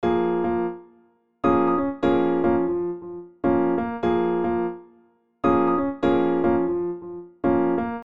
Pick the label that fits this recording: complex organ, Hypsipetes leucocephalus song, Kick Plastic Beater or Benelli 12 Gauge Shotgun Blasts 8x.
complex organ